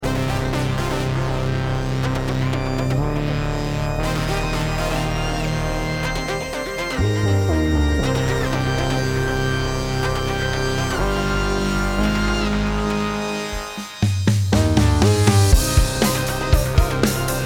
Intro Edm; super rolling heroes deluxe; ps5 switch windows xbox one xbox series xs; Typhon Gameplaycut

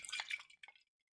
Impact Flask

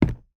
Footstep

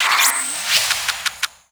SFX - Draw Four; uno

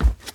Step Hard Surface

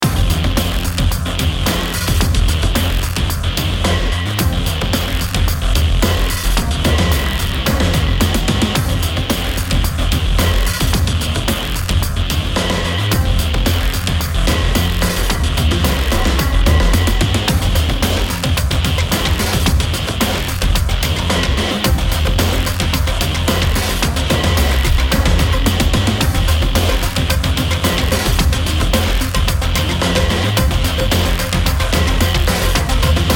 Electronic

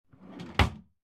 Drawer Close

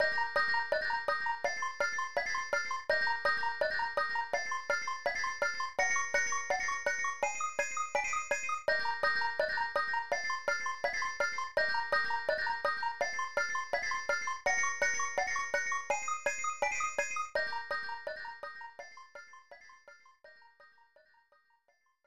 Hurry Up! P Switch BGM; SMB3; Super Mario All-Stars; SNES

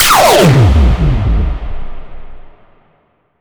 Fx Impact Shield; bass house

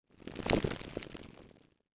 Passby Debris

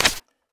U I Paper

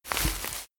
Leaf

